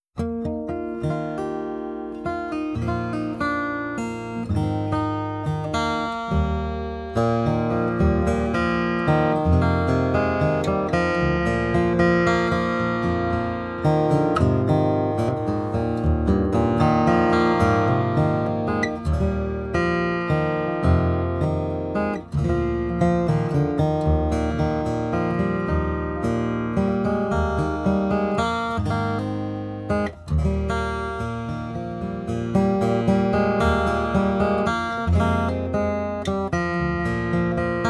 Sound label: Waltz Mahogany Baritone Gtr